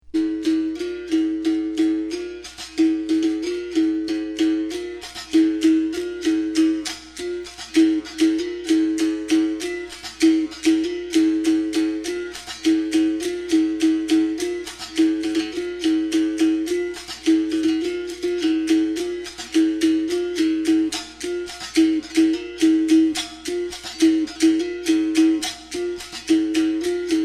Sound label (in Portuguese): toque idalina, capoeira; toques